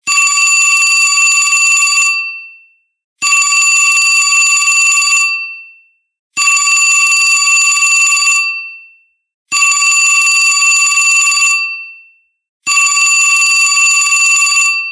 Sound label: Vintage Telephone, ringtones; E739 myTouch; LG